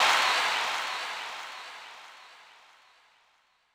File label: Dance Kit, Korg 01W